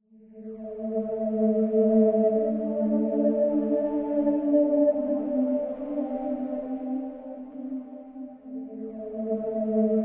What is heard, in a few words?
Ghostly Pad